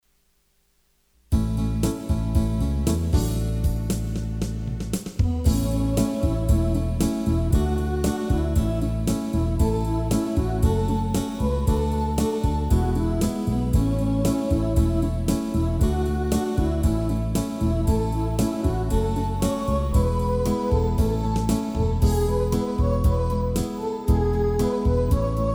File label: ACCOMPAIMENT ONLY